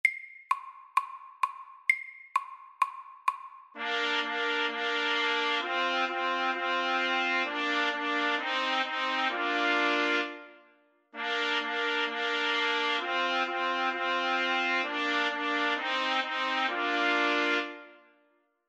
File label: First fanfare FAST 130